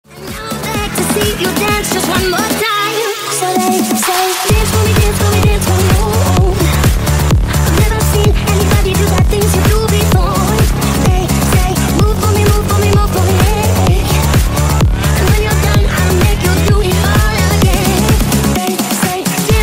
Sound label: workout remix